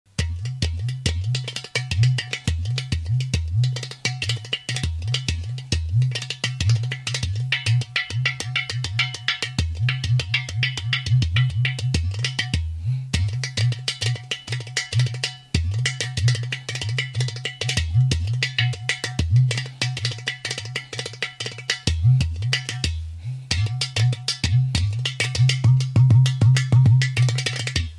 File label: kim kim; کوزه